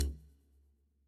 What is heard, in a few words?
Loop Tail; Good Job